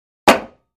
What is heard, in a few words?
Free Weights Exerci